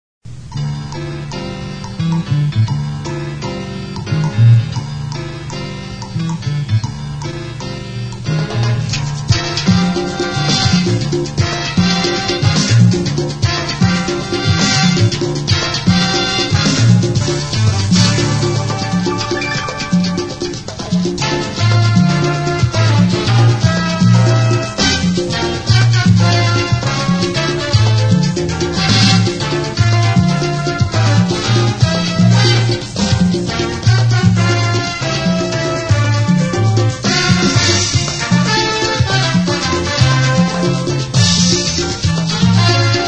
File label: Latin Jazz Mambo